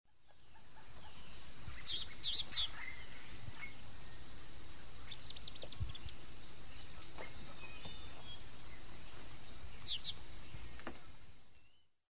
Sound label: Griseotyrannus aurantioatrocristatus, call; S. America; Eastern Lowlands